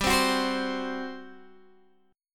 G Major 7th Suspended 4th, G, down, Guitar, Open G